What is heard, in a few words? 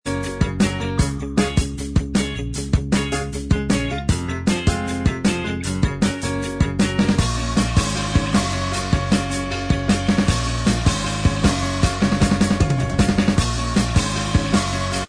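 instrumental, backing tracks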